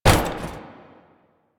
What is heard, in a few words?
Metal Ladder Hit